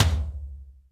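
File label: tom, real mega drums; Best Service; Real Mega Drums VOL-1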